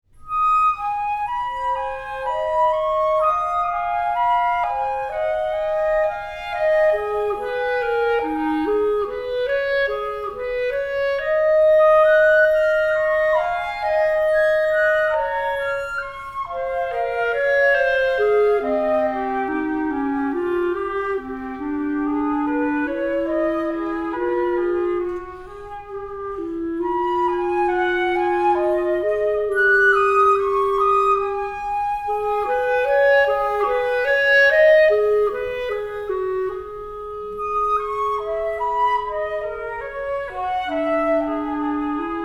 duos for flute and clarinet iii

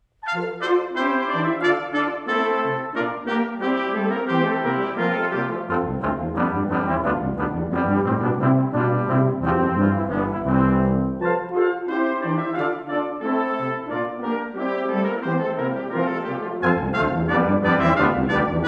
gavotte

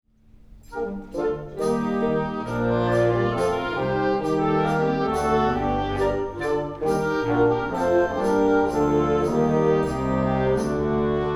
tanze aus terpsichore ii gaillardes

